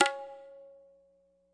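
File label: classic hip hop tambourine; STYLE KITS (Hip Hop, Etc); Classic Hip Hop Mega Kit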